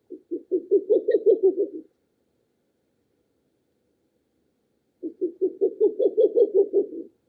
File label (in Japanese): fukurou